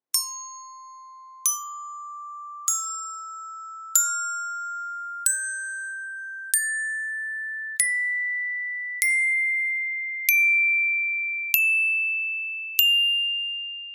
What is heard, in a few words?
Plastic Club